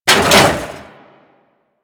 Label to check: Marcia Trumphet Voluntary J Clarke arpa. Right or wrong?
Wrong